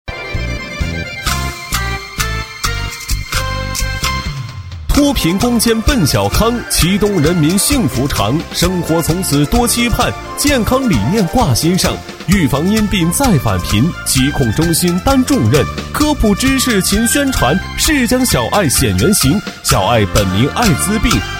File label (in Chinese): 男 快板 防艾控艾歌, 年轻气质 轻松活泼; 男D2-快板 防艾控艾歌